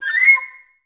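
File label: flute pickup C6; Best Service; Peter Siedlaczek's Advanced Orchestra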